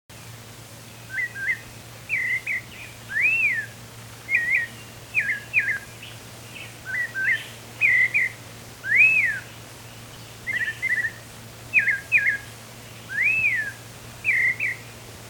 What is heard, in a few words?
White chinned Thrush song; Robert L. Sutton